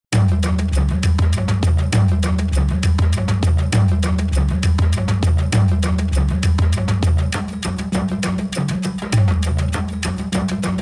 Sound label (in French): Afrique tribale Soliste